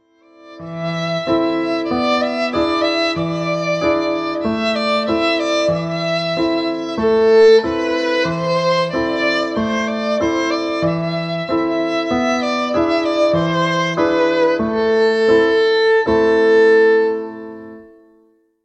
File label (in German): langsam